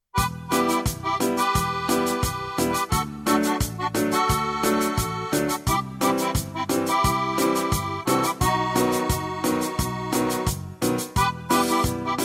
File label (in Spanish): Cumbia; ritmos